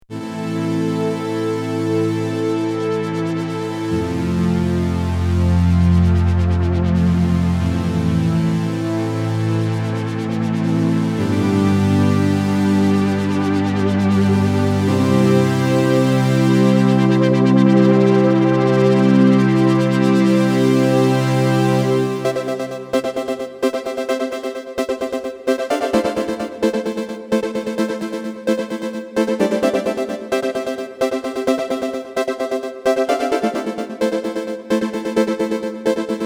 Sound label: Tri-Saw; xp-80